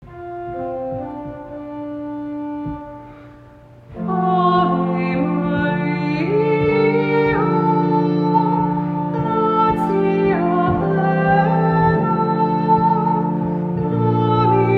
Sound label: Ave Maria chant; wedding music